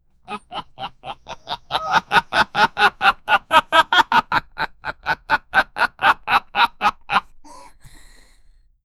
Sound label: other animals laughing fa, animals